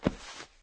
clothes, inventory

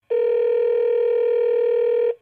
call, iphone

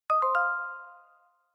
bonus activated, phone

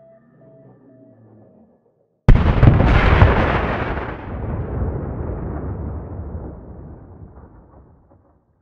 heli Crash

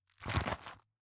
medkit

